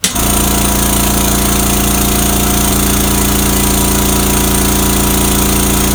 river barge electric motor; Left 4 Dead 2

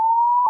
bonus; Tank

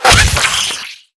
bullet impact, infected gore; npc; bullets

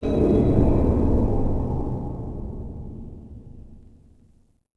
Space Junk Bump; Ambient; Sid Meier's Civilization 4 Complete; Beyond the Sword

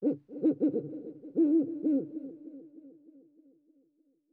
owl; ambient; Team Fortress 2

